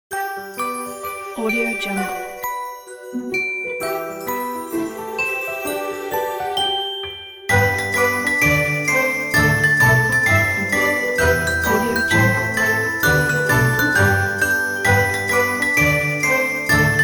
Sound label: little christmas